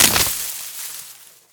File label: bush break